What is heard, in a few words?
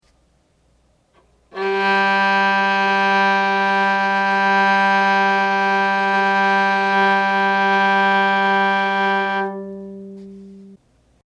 Tune Viola G; tuneguide